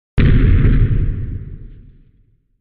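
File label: Explo Somewhat Distant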